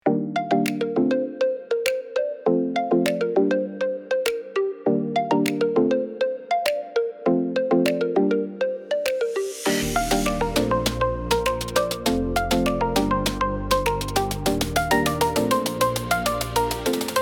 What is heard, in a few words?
Tropical melodic sunset glow